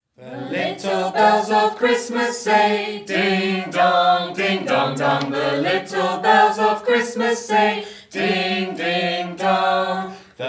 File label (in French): chant canon, choir christmas carol; christmas carols